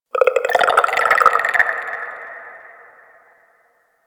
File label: Infested Tendon Chatter; Warframe; Corpus Infested